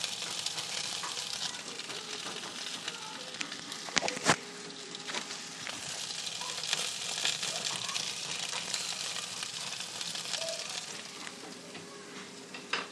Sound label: the sound of 24 sausages sizzling away; yumbo